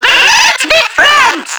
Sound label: Proto Infested Ancient Tentacle Swing; Warframe; Abilities